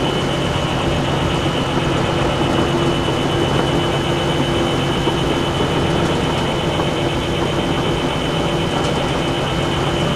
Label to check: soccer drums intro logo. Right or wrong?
Wrong